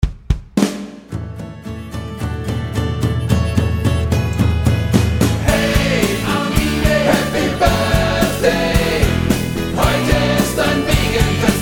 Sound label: Happy Birthday Armide